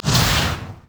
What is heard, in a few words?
ghoul; LoL; yorick